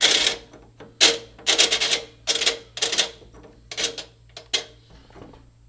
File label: Washer Knob Turn, washing machine